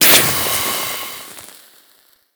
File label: Infested Pistol Gas Burst; Weapons